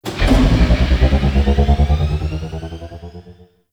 UI Mod Activate Engine; Warframe; Railjack; Player Ship

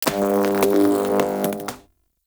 Teralyst Electric Step; Enemies; Steps; Warframe; Eidolon

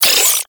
Low Clip; Weapons; Warframe; Tenno; Oraxia

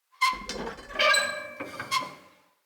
Light Mirror Hang Squeak H; Puzzles

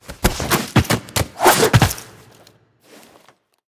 Foley Flips; Rail Jack Spawn; Tenno

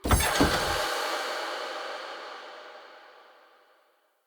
Chest Open

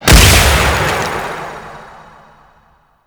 Ice Ball Cast Stereo; Warframe; Frost

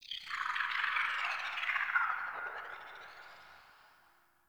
tunnel critter; Warframe; Tau

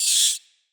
Deco Rat Squeak Angry A; Ambient; Vocals; Verminia; Animals

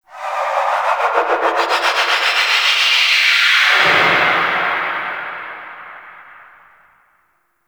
Undercroft Shriek Tonal Verb One Off; Ambience; Warframe; Duviri

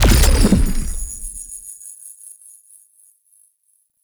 Portal Beam Fire; Enemies; Abilities; Warframe; Zariman; Void Angel

